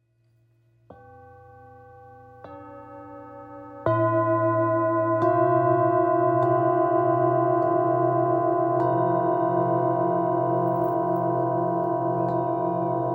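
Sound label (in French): Set, bols chantants tibétains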